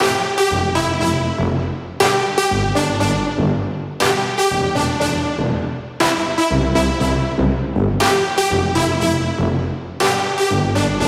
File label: Brass Loop 120BPM CMaj; Brasses; Instrument Loops; 99Sounds; Monosounds